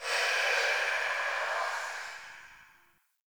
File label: Rifle Reload Void Layer; Weapons; Warframe; Zariman